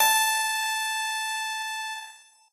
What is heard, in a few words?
piano; pianojs